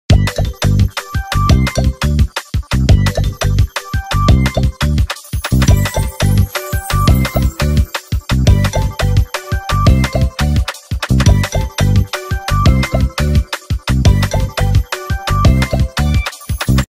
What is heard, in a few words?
Minigame- Balloon Popping (Fast); Serdin; Grand Chase